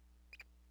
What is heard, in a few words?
Chirrup, rat